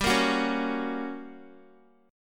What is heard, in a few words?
G Minor Major 7th Flat 5th G, Guitar; strummed; 4F,4A,5C,5G,5C,6E; x,10,10,11,13,x